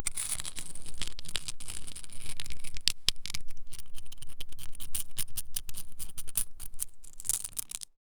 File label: bunch of very small rocks; texture